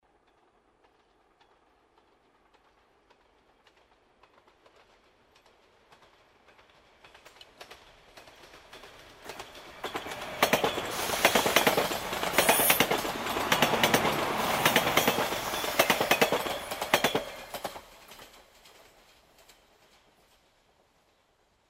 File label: metro